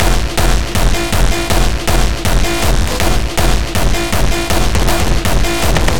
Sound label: Beats Loop; Anger Foot